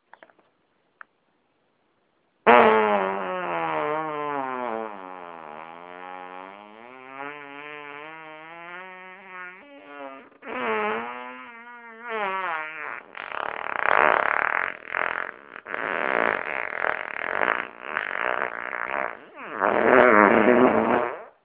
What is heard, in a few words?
coffee grinder